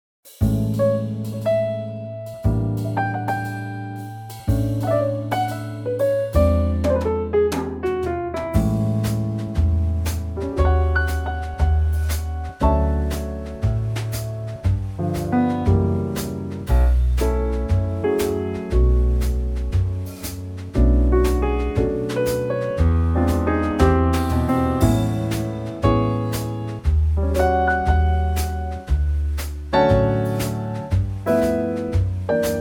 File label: A Trio